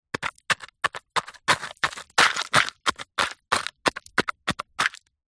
industrial fossicking trowel plastic digs scrapes dirt stones dry mud